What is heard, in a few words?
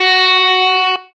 electric guitar